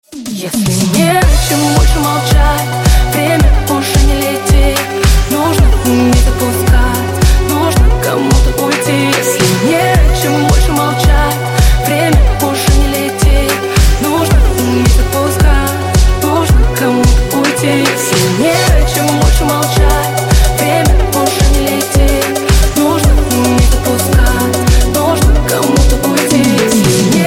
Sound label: piano synthwave remix